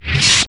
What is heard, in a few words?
saber off fast; lightsaber